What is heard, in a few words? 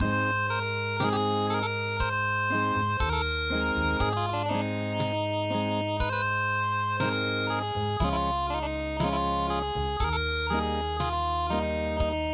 Acoustic